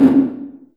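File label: large room 808, reverb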